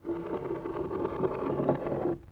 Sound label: Glass Slide Only; Vintage Cartoon; Foley